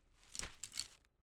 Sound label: Grab arrow